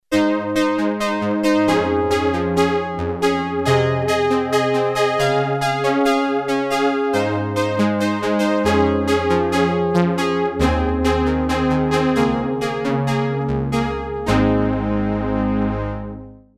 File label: P600 Pan Polysynth